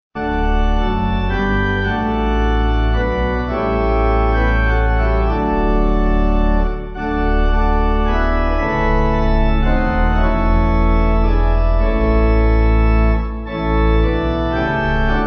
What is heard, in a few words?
Pipe; Hornsea